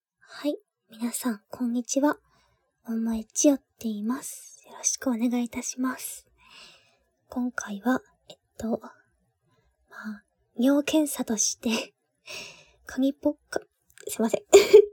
紙コップにおしっこ

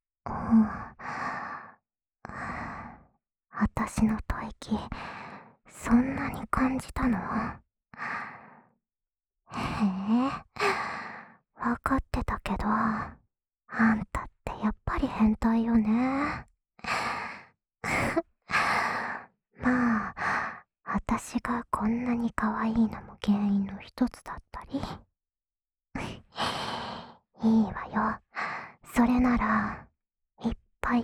安眠用お耳ふーふーループ（右耳）

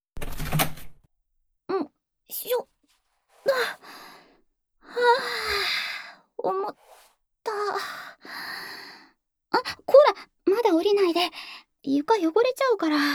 シャワー＆シャンプー; まずは、全身くまなく綺麗にして……。

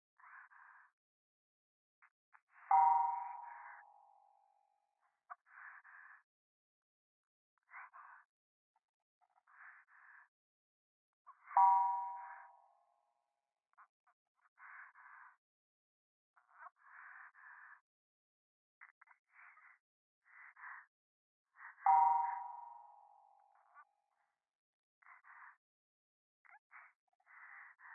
電話 左耳密着処女喘ぎ 寺

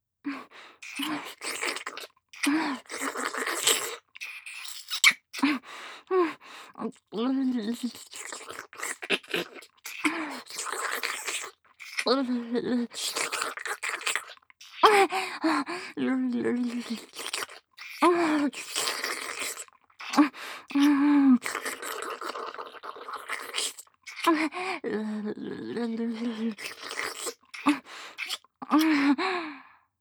乳首舐め（激しめ)

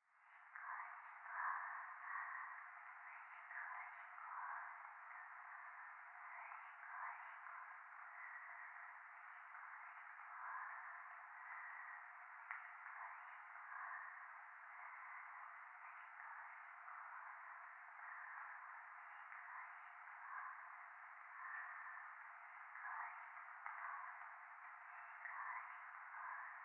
教会 しこしこ 雨

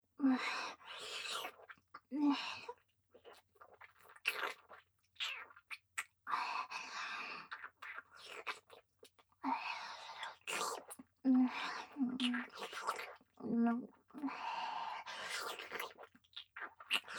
舐め フェラ（ゆっくり）

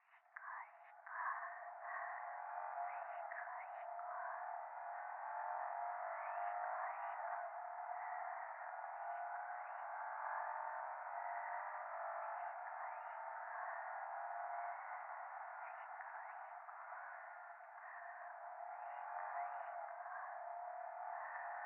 しこしこ 車の中

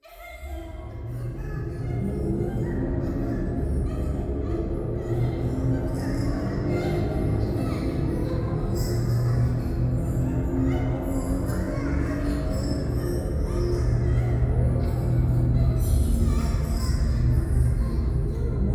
風呂 喘ぎ 車の中

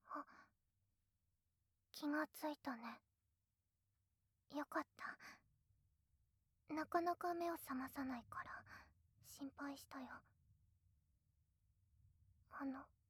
お耳いたずら・わくわく耳舐め; 効果音なし

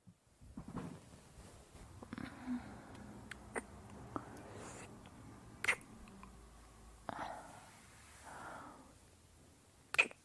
脳みそとろけそうになるお姉さんのえっちなちゅぱちゅぱ耳舐めボイス

